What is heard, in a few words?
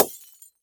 neon tube break